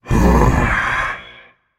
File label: breath, voicepack; smite; jorm